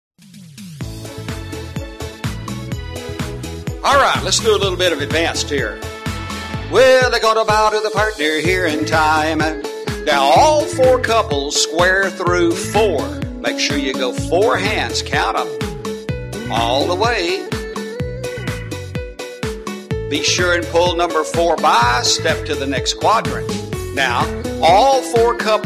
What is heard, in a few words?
Patter